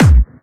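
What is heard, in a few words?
BD Clubby, bass drum; VEC1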